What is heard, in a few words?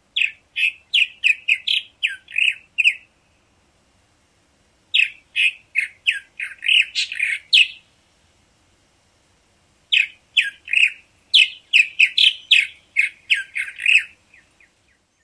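PURMAR dawn song, purple martin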